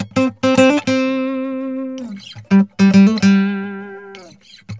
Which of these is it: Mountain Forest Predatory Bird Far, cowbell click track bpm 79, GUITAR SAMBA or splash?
GUITAR SAMBA